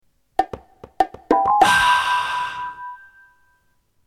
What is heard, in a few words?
bongo